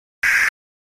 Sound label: Static HF Rip, static